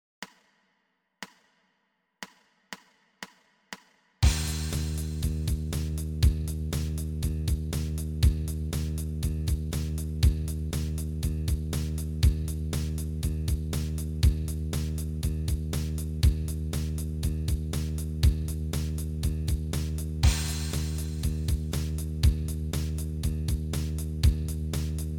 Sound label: bass startup Eb 120